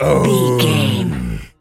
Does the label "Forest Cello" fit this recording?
No